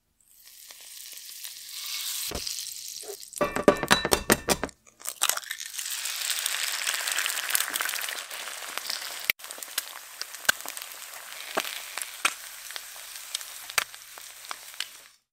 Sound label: fried egg